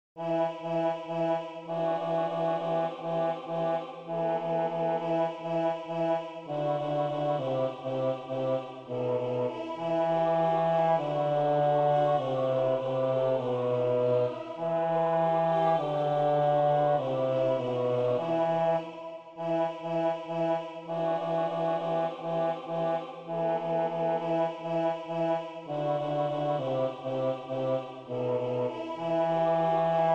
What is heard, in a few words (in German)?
Tenor; Red red Rose